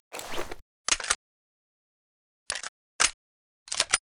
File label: pp19 bizon reload; STALZONE